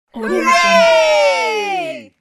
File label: family group hooray shout; fxprosound